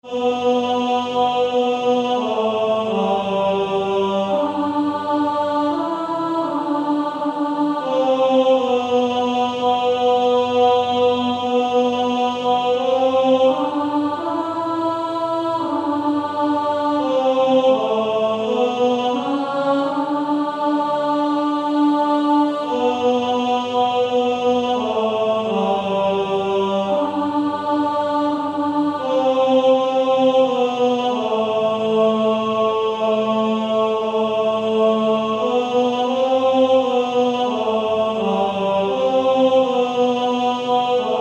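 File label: Male Choir, second tenor; TTBB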